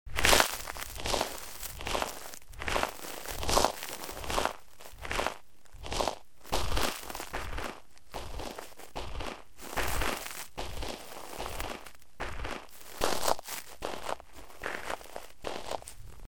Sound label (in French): Pas sur algues seches